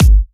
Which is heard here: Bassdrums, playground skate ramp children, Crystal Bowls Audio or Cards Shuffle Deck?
Bassdrums